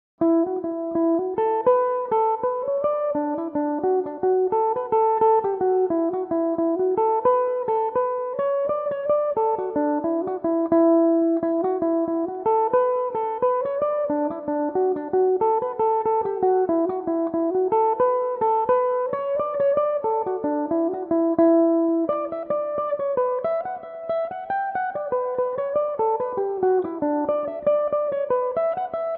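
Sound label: E dorian, Irish mandolin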